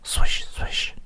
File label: swish swish